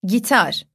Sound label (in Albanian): gitar